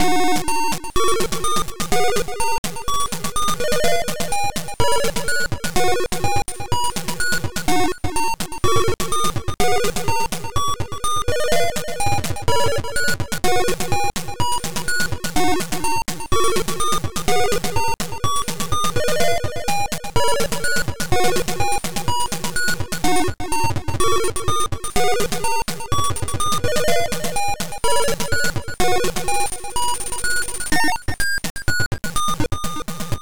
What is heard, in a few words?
arabic